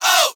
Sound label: "oh" group; ooh ahh